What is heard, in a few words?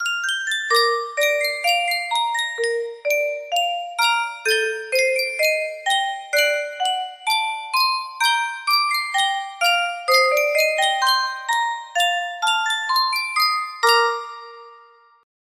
music box; yunsheng custom tune